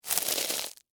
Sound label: Crunch Ice Cream Cone, Soft, Debris; Texture, Crunch